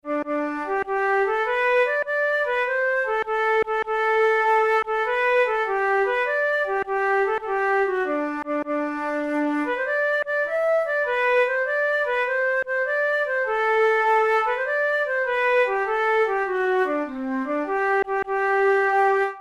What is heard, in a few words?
song, flute tunes; New Year's song